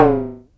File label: SYNCUSSION